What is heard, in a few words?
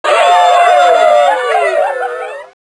crowd; Virtual Pool Tournament Edition